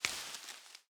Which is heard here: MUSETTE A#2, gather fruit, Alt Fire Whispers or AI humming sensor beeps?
gather fruit